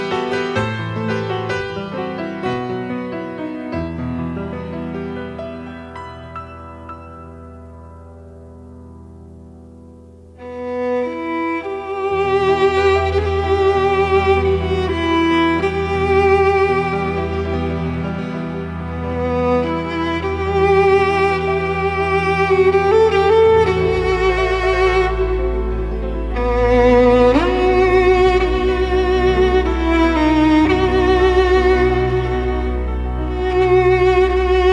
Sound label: Violin